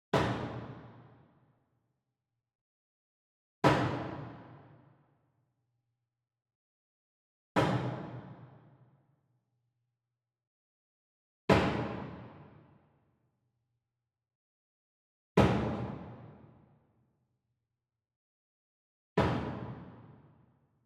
SWEETENER Wood Box Hammer Scrape Hit Far; boom library; Cinematic Strikes Construction Kit

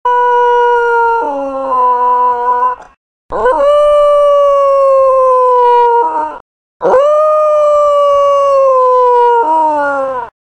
howl; Apotheorasis

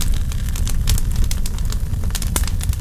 Fire Barrel Loop; Destruction

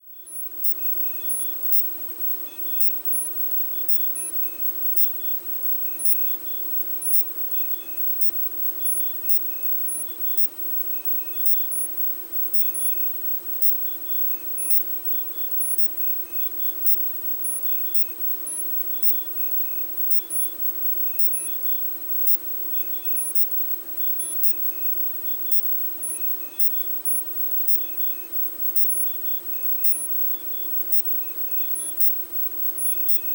Surveillance Truck; Medical; Blastwave

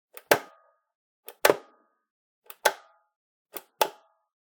Generic Button Metal Medium

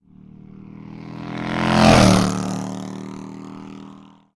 motorbike; Blind Drive

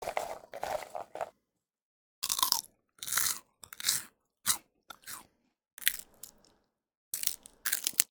eating flips; human; NS Studios - ultra sound collection

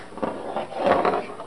Pinball Ball Roll

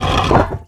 Menu Horror

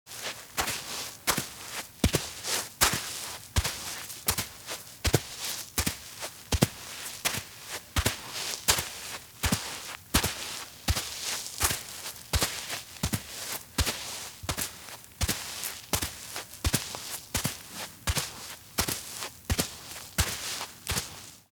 Bare Feet Walk at Medium Pace on Sand; Sound Ideas; Footstep Sound Effects

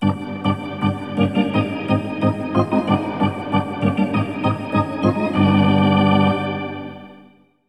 Hockey Organ

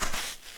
sand; firefight

